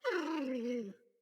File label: Puppy Idle Coo; Enemies; Vocals; Kubrow